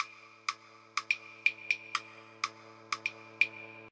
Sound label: techno groove hats, hi-hat loops; drum loops